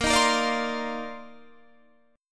C Minor 11th A sharp

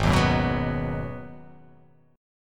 Ab Minor 7th, chord; strummed; JGuitar

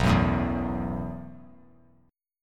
B 7th Suspended 4th B down

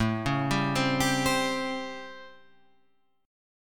A 7th Sharp 9th, Guitar, Open F; A7#9; arpeggiated; JGuitar

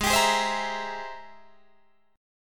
G sharp Augmented Major 9th G sharp Guitar Open D

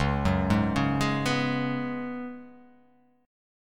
C sharp 7th Suspended 4th C sharp

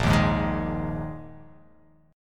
Bb 7th Suspended 4th Bb; strummed; JGuitar

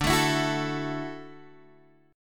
D-Major Add 9th D down Guitar Open G

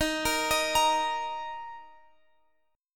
D sharp 5th; D#5 Chord {X 18 20 20 X 18} arpeggiated

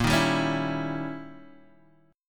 D sharp 7th Flat 9th, A sharp

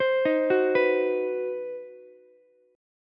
C Minor Major 7th Flat 5th chord, Ukulele; arpeggiated; JGuitar

